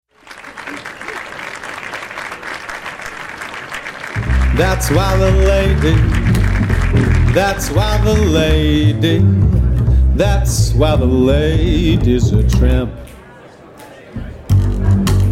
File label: Jazz Live Recording